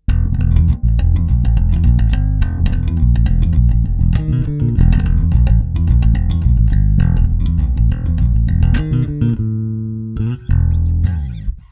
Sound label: Both full boost; consolidated